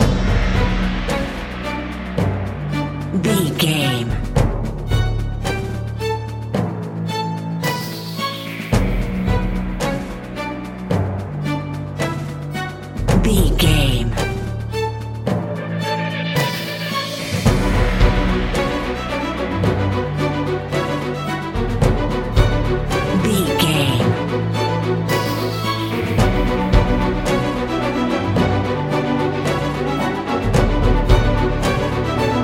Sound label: epic battle 110 bpm loop